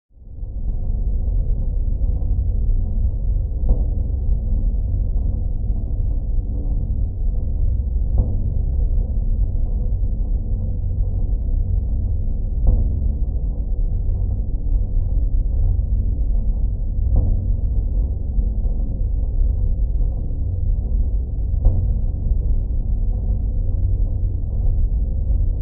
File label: Space Ship Hall Hum